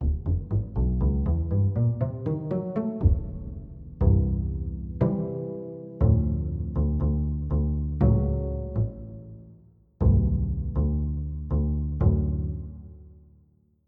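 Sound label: Pizzicato Wet, bass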